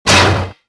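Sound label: run, footsteps; Star Wars Jedi Knight II Jedi Outcast; XBOX; mark1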